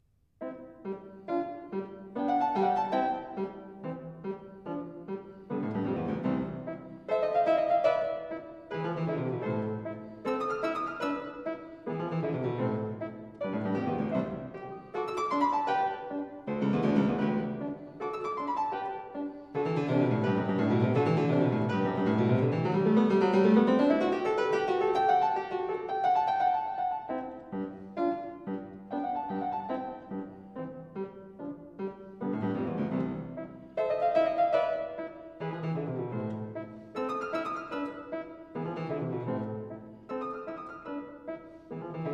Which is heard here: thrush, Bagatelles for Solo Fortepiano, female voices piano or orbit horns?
Bagatelles for Solo Fortepiano